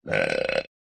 Man burping disgusting long

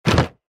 Puzzle game tile swap; video game

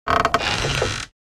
Vampire coffin lid open

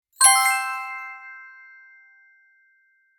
Twinkle idea ding; positive

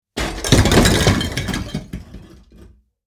Big blocks structure collapse on bricks and rocks

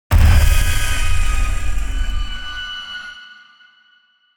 Granular madness impact jumpscare suspense

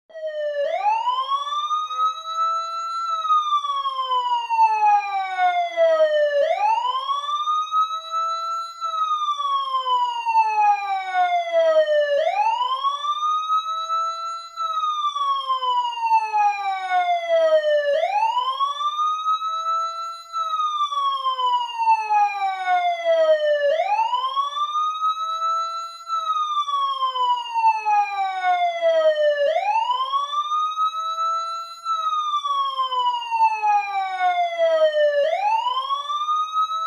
Fire truck passing by with siren

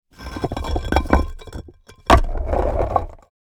Big sewer cover plate dragged on concrete

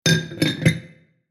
Stacking glassware; household

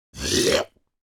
Male clearing his throat

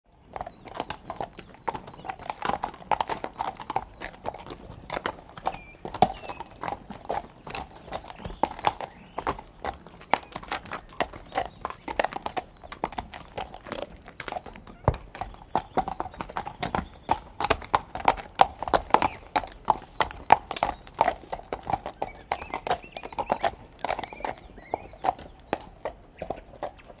Donkey hooves walking on a concrete surface

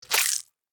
Worm squashed organic impact; slug and worm

